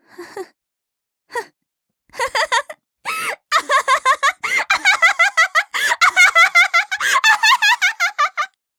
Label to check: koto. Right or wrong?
Right